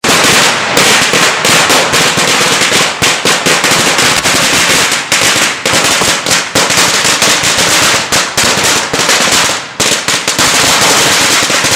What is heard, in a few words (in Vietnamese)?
tiếng pháo 10000 thái lan